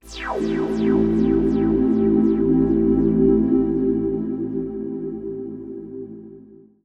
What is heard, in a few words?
TECHNO PAD 140; Keys